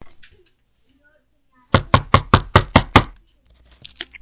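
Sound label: soft knock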